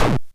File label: Shot; tempest 4000